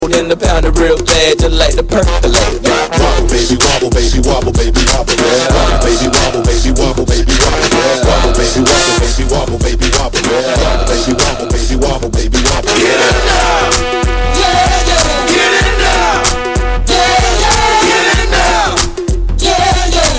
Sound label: Wobble